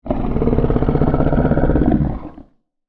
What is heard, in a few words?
Monster Yeti Growl, Creature; Horror; Monsters; Blast Wave